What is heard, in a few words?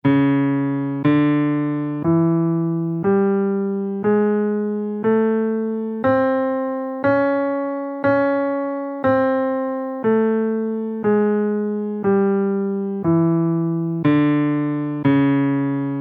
todi scale in Db